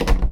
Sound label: door close, household; NS Studios - ultra sound collection